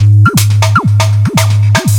Dance Drumloop; Drums; Dance Beats

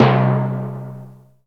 C2 TIMPNI; roland1

